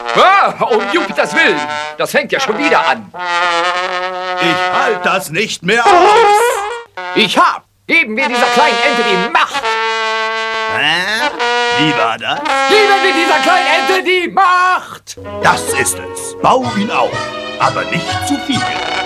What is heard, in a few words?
trombone; Jupiter; Vulkan